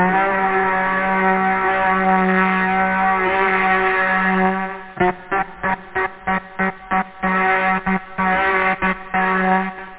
transit, scratch; da capo vol1

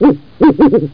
bird; Quake; PainKeep

